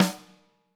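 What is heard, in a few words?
snare drum, Drums; Bob Clearmountain Drums I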